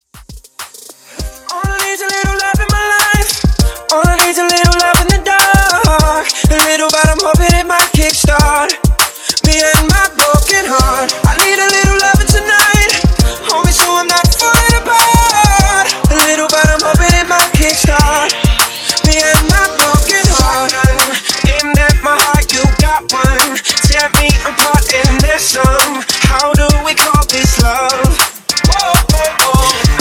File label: Alternative Redrum Hook 100 BPM